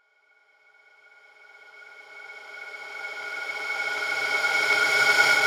Impact R

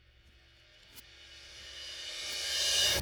cymbal, Reverse

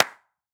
Acoustic Clap

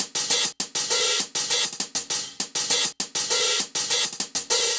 Acoustic Hat Loop 100

